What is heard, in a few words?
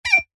foley glass rub squeak finger single; zapsplat